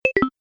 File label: Game Item Removed, Video Game Sound Effects